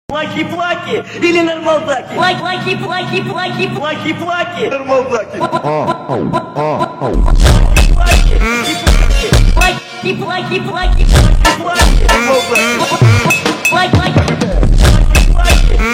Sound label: Brazilian Phonk